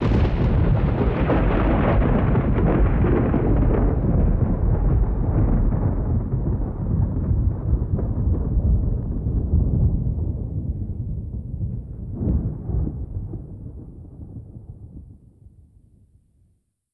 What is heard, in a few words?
mortar hit; weapons; valve